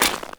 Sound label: Step Ice, Footsteps; Warframe; Grineer; Royal Jester; Foley